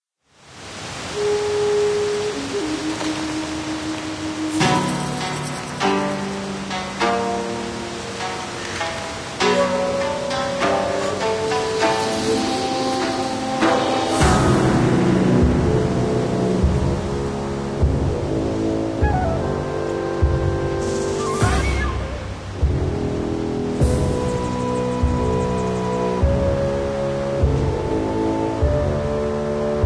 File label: Menu; Hero Siege; Android